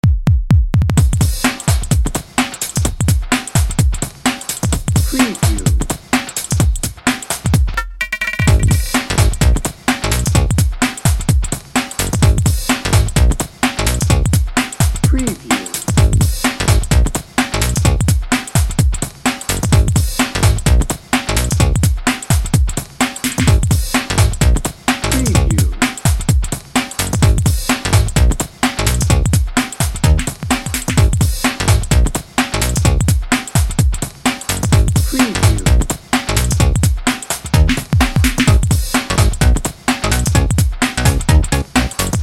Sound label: booty shaker